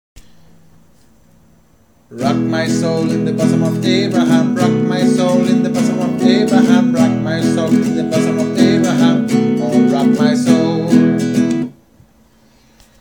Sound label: mezzo; rock my sould